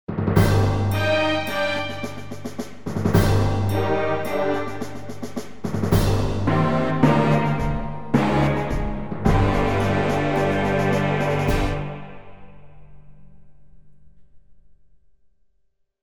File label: Death Screen; Super Trench Attack